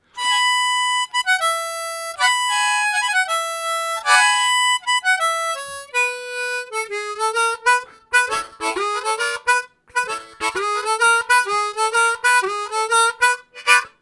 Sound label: High Blues, Harmonica; Zero G